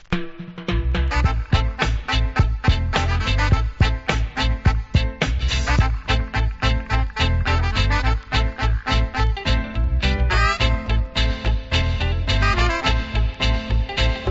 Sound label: Roots Dub